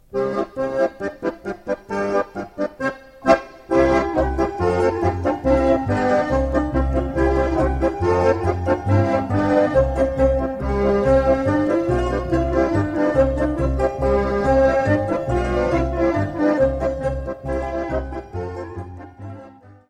Kolo, Serbie

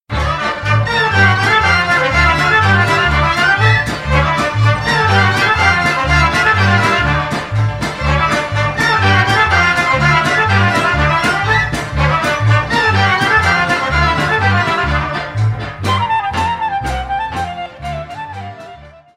Ciobanasul, Roumanie

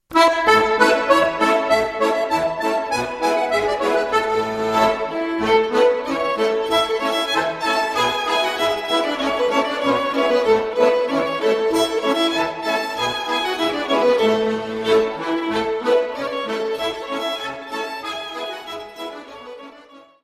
Maclotes de Stavelot (Maclote + Allemande + Amoureuse) - Belgique

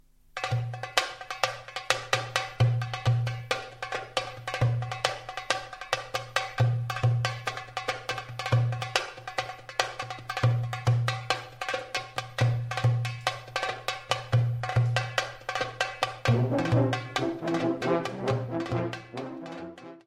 Tsifteteli, Macedoine

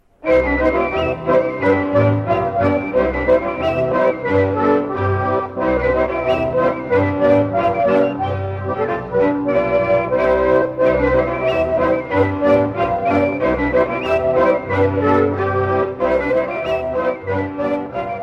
Maclote d'Erezee, Belgique

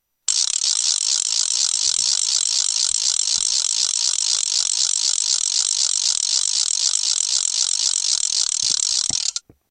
crecelle; instruments

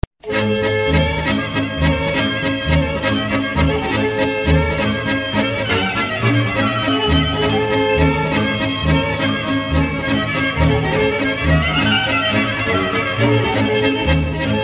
Geampara - Roumanie

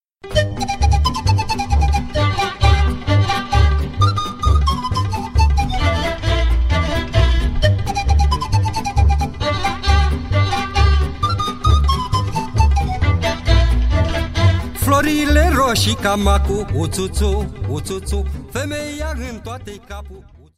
Roumanie; Vuvarul

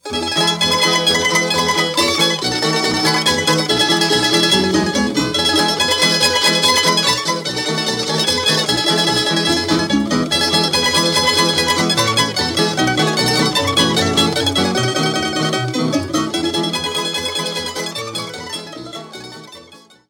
Slovenie

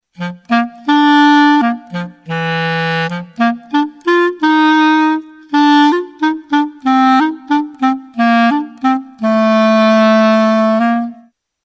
instruments clarinette

